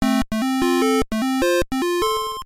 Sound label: Ending; Karateka; Atari 7800